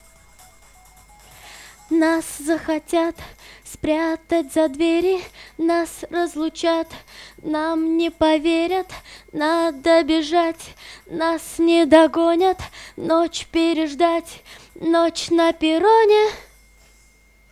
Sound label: VOX Couplet, acapellas